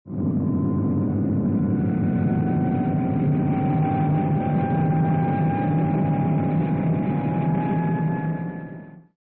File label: Liftoff